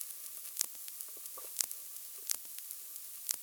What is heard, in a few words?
foley beautiful dirt